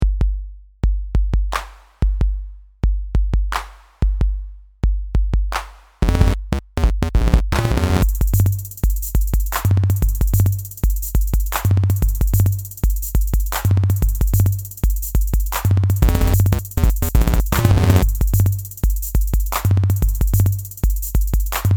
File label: instrumental